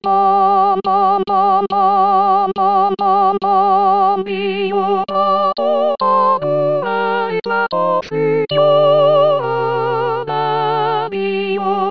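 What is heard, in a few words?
soprano; Guillot prends ton tambourin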